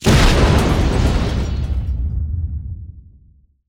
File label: explo grenade; Explosions; PremiumBeat